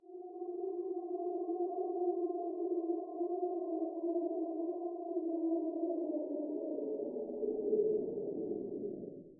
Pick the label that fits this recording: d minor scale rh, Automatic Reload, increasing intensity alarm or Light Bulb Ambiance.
Light Bulb Ambiance